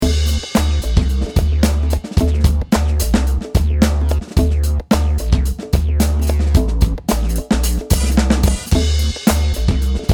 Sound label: Acoustic drums 25ms